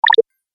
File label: Digital Code Scan; Beep Sound Effects